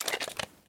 gun foley blaster draw; reload

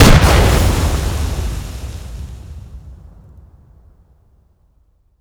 explosions

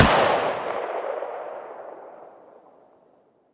mg42 stop; emplacement; garrysmod; gredwitch s emplacement pack

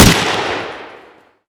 shoot, kord; emplacement

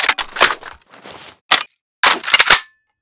dshk reload, emplacement; dhsk

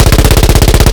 mg3 loop; emplacement

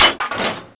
reload big; emplacement

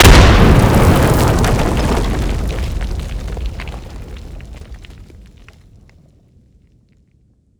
cache explode; emplacement

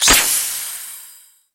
overheat overheated, reload

